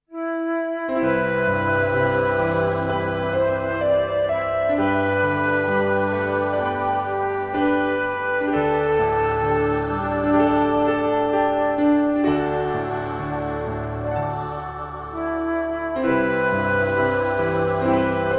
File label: Fallen Leaves Flute Version; Star Ocean 3